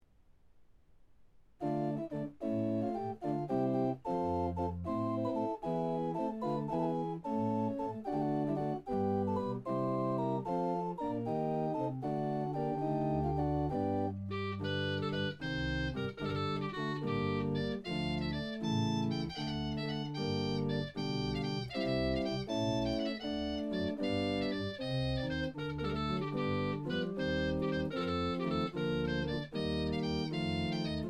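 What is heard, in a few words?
Improvisations, organ; 1882; Cavaille-Coll; Caen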